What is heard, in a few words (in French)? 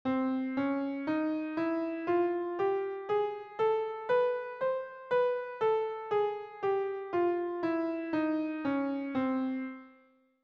gamme transpo